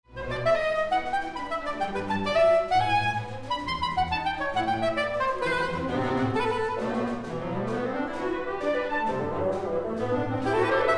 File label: Soprano Sax Concerto; ORCHESTRAL WORKS